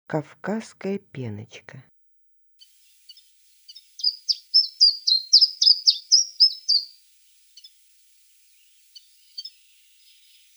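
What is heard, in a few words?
Caucasian chiffchaff voice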